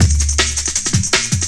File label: Champion Break, breaks; m8 breaks